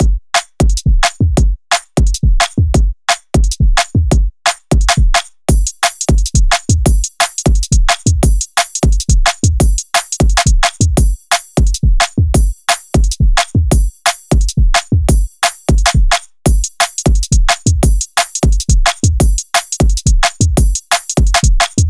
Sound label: 175 BPM